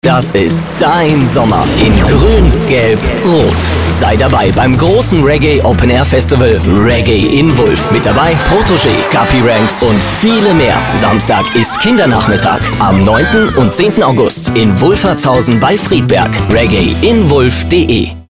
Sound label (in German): Raggae